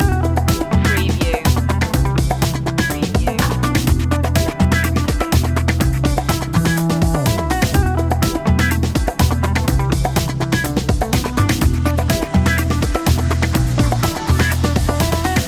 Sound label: u funk loop